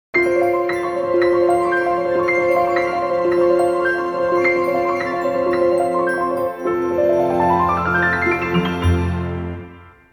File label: Fantastic link, jingle; Jingles medley